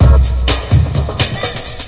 127 bpm, way to heavy super rave, drum loops and breaks